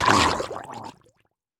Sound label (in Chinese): 水中死亡声; 溺尸